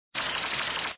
Flying; Tails; Tails Nightmare 2

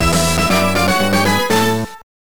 Win; Animal Snap Rescue Them 2 by 2; gba

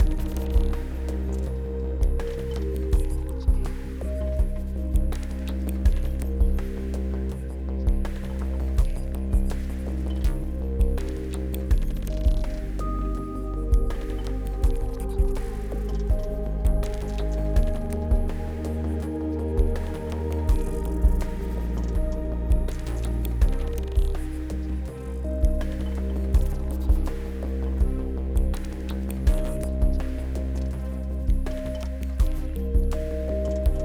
Vive Exploration 8CH 82BPM

